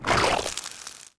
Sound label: meat Hit; weapons